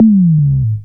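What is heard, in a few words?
percussion FX, Dance Drums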